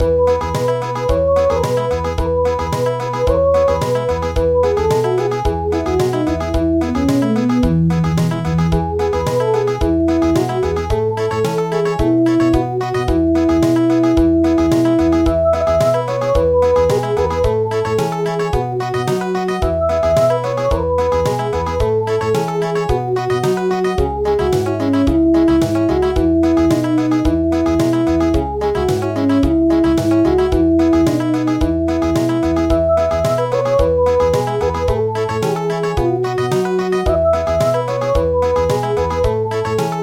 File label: Country; He is born